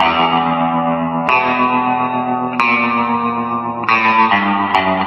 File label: guitar, Loops, Phonky